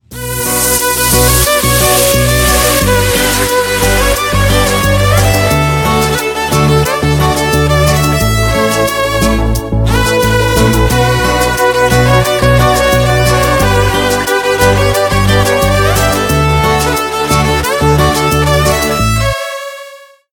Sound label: violin ringtone